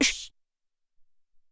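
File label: Vox Snare, Alesis SR-18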